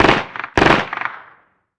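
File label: gun miniuzi medium; ModernWarfare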